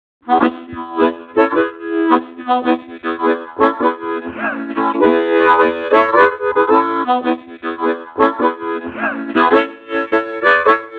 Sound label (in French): Harmo en riff minimaux; Combo3W